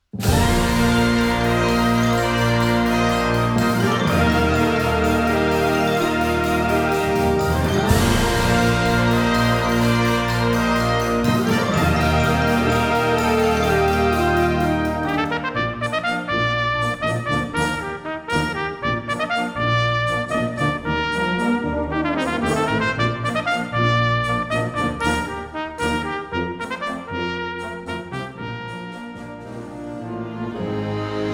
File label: Trumpet Circle